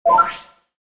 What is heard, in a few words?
Bonus; RandomGFGoStop